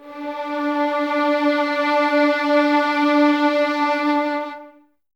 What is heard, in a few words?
D 4, 11 Violins, Soft; Miroslav Vitous